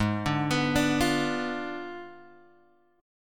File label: Ab Diminished 7th Ab, Guitar, Open F; Abdim7; arpeggiated